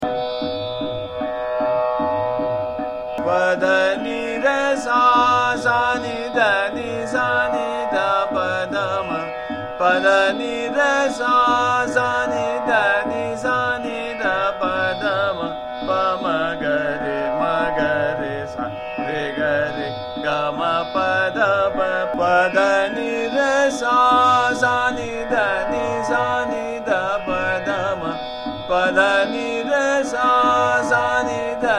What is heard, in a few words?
Sargam Pa Dha Ni Re Sa Sa Ni Trital, Keerwani